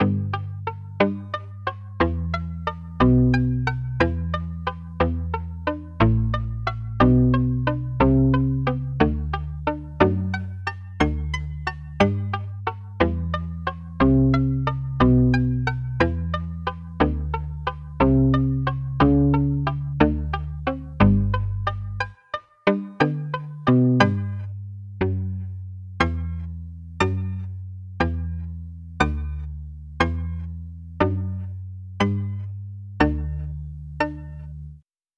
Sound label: palm muted guitar bach